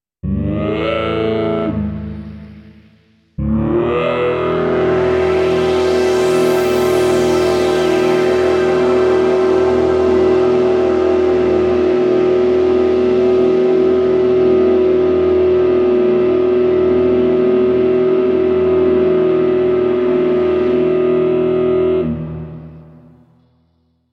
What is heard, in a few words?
Korg KROSS 2 Synth Combis